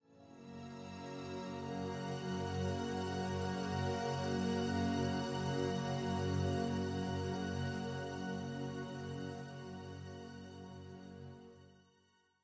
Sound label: 85bpm; Legends of Pegasus; windows gamerip 2012